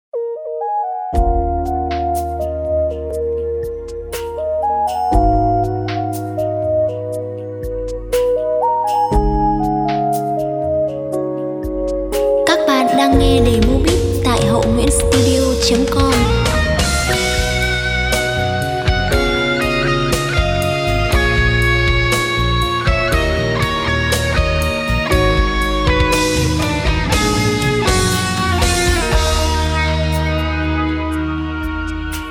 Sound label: beat, playback